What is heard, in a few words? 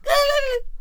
Bomb Cannon Attack Vocals